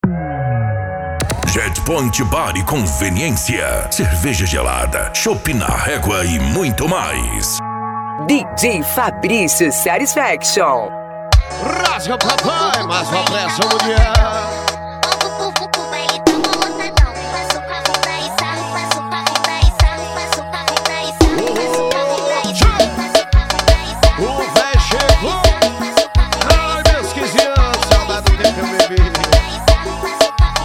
esp sertanejo

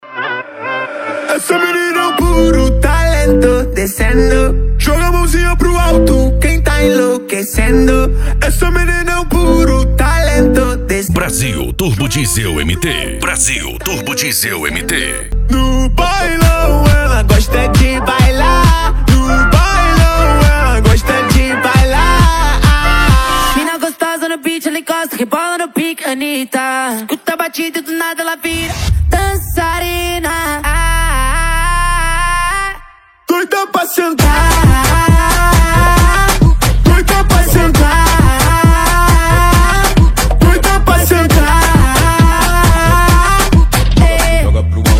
deep e funk antigo

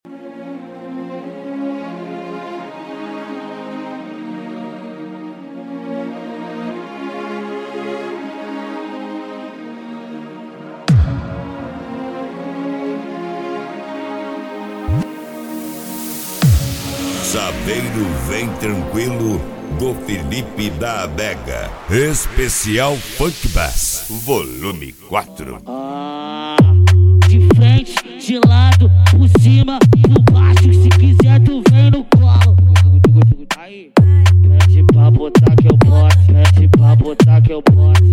esp funk bass

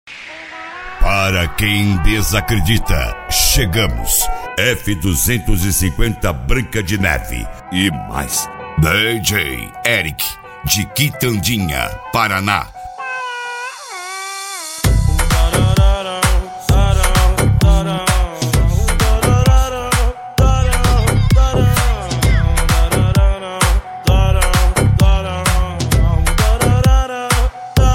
MEGA FUNK ESPECIAL

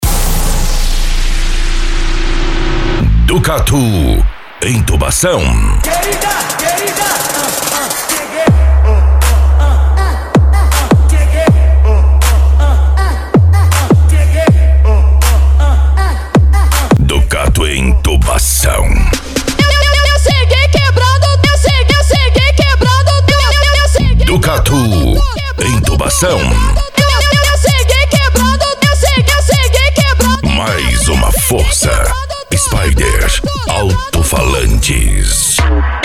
funk agressivo

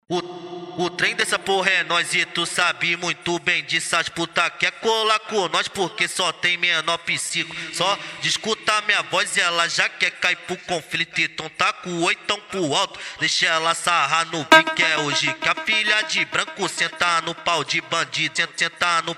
funk grave

